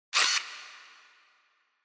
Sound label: Camera Film